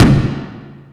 Surdo, Yamaha SY22

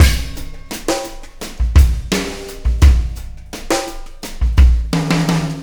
Beat, Loops

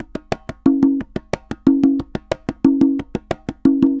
Congas, Festa Latina, Drums & Percussion; Best Service; Festa Latina VOL-1

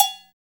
POP AGOGO LO; Drums In Your FACE!

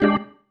Stab One Shots, Organ

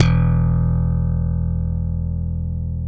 BS, Funk Bass, Brite Thumbs; Roland LCDP02 Guitar and Bass

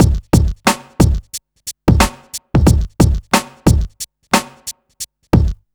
BEAT, Burning Grunge Hip Hop

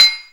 METAL HIT; Back In Time Records - Big Bang

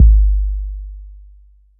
kik Boom, Akai MPC-5000

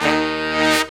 BRS SHRT, R&R Horns, Sect; Roland L-CDX-03

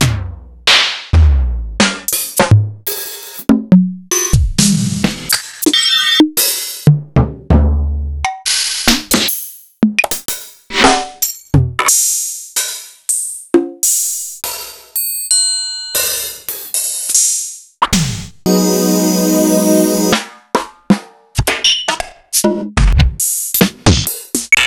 EMU Xtreme Lead; Sliced

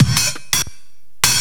loop, Drum & Bass, 170 BPM

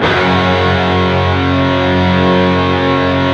PRS PCSG 2-L, guitars; PRS PWR SLID

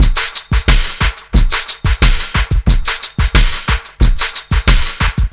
panel beater, 90bpm

